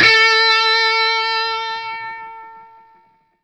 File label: SINGLE A, Vintage Blues Guitar, ELEC.GTR; AKAI; USB Soundscan vol.22